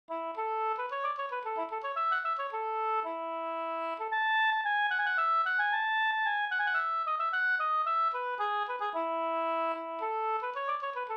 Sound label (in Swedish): polska Bingsjopolska; Hjortingens polska e Pekkos Helmer